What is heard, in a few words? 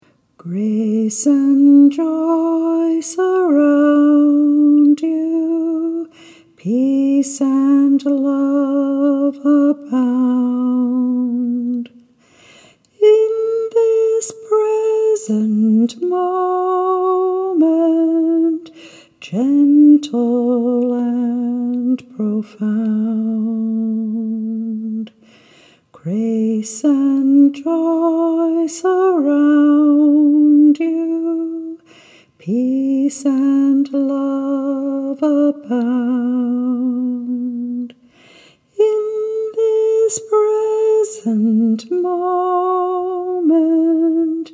melody